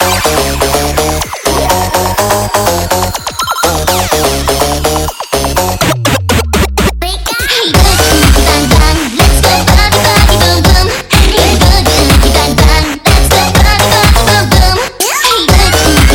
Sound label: Shuffle